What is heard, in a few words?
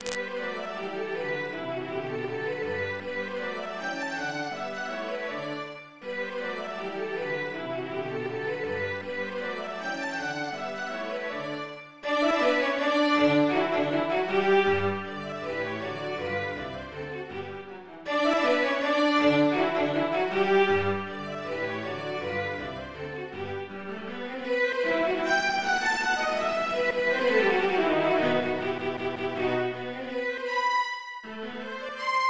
for strings; Beethoven Piano Sonata No.25=3